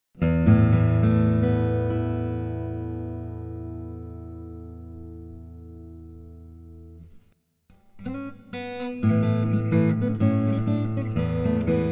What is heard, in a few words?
hornpipe, fingerstyle; fairies hornpipe